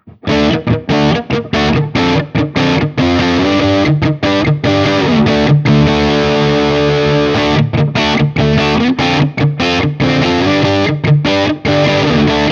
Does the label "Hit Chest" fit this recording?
No